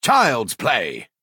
attack; thalmor agent

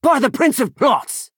attack; acolyte of boethiah

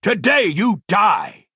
attack; lucien lachance

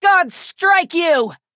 attack; high rock summoner

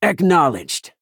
imperial grunt attack

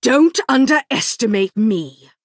attack; alfe fyr

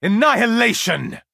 attack; skywatch vindicator

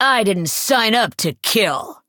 court wizard attack

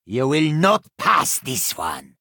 attack; baandari bruiser

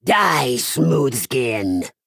attack; stormhold henchman

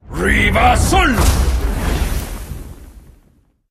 Soul tear

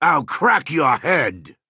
attack; merric-at-aswala

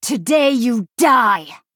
attack; niben bay cutthroat

